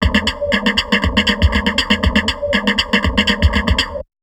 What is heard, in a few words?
Dist 120, Drum Loops Crazy Processed; USB Soundscan vol.07; AKAI; 90s sample CD